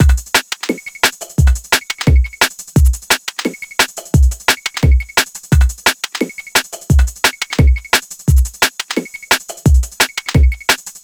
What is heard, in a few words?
174 3-step Drum Loop